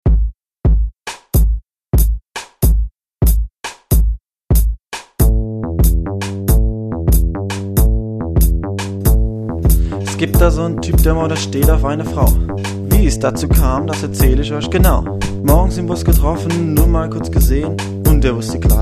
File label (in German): Rap mit Gesang